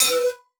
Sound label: Animation Short; Multimedia Internet CD-Rom Flash